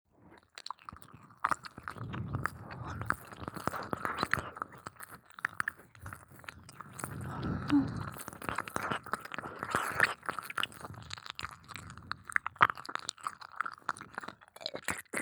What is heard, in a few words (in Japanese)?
耳舐め・激しい・右耳